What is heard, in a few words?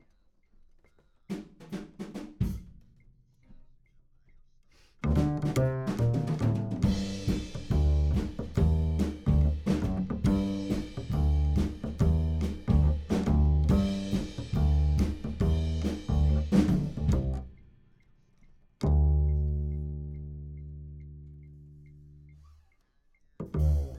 Bass